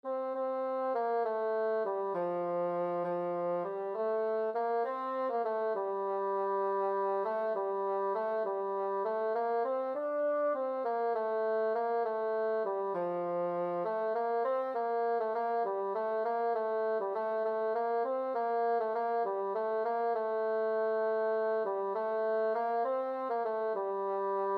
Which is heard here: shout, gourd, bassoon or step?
bassoon